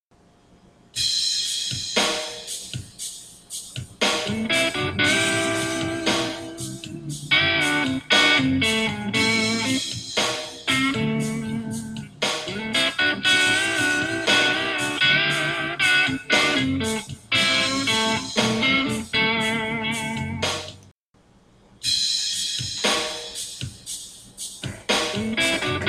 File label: double stop bends